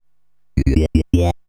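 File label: synth bass, drum and bass, 160 bpm, C; eJay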